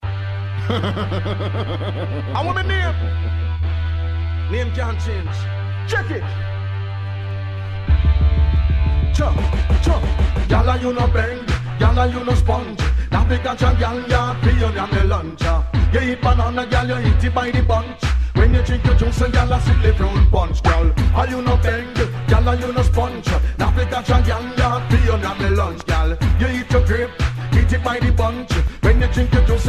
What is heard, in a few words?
riddim